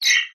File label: negative game sound fx arcade casino kids mobile app